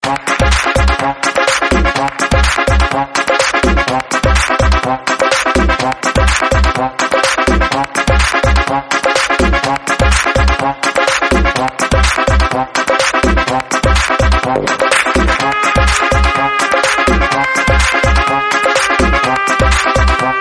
mexican electronica tone

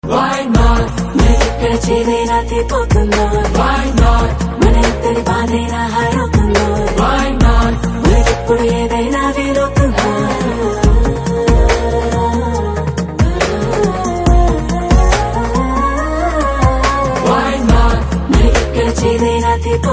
telugu love tone ringtone

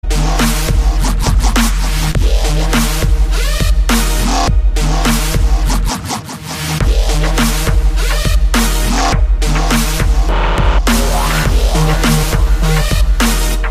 ringtone

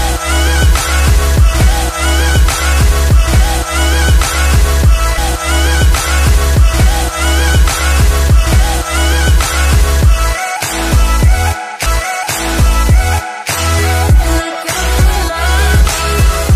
loop roll ringtone

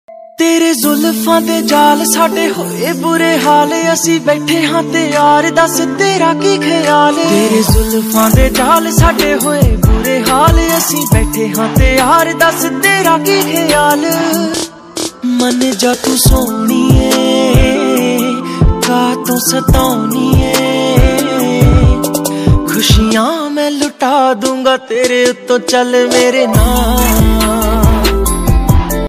punjabi ringtone

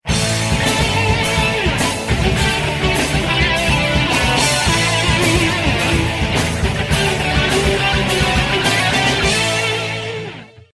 classical iphone ringtone

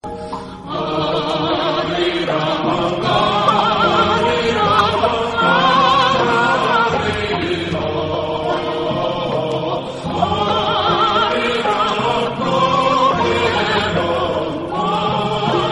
japanese samurai ringtones

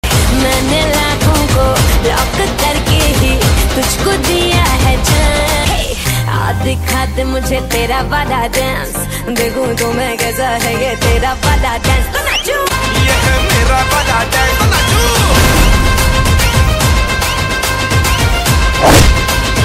bollywood dance female version ringtone

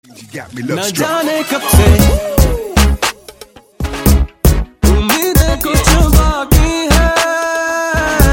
hindi hip hop ringtone

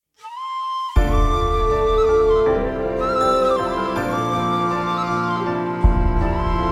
unplugged ringtone

